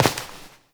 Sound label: Step; Warframe; Koumei